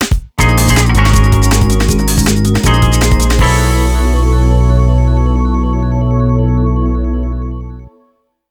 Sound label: Jingle; Gran Turismo 4